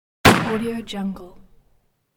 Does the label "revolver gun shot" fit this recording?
Yes